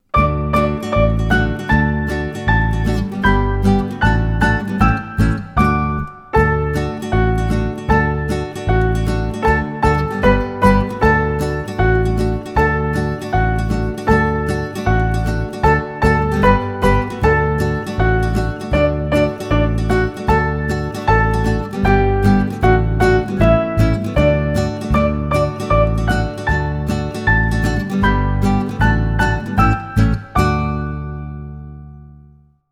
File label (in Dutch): eekhoorn met je lange staartje zonder zang